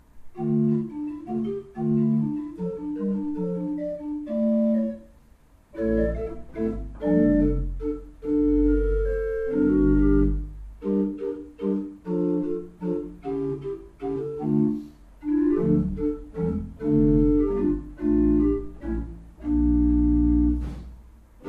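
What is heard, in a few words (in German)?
Orgel, pfeifenorgeln; Kreienbrink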